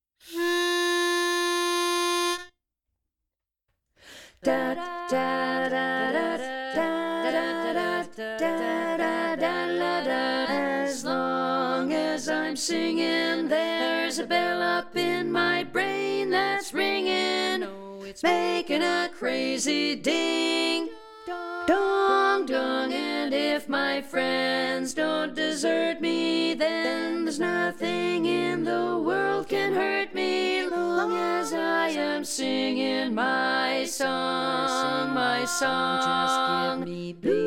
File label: bari predominant; harmony